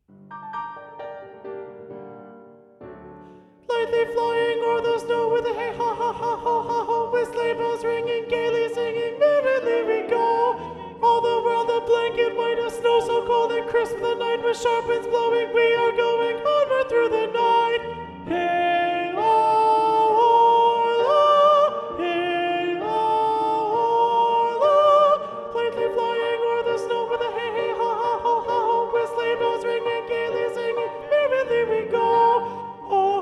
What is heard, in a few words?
SSA soprano 1 predominant; a la russe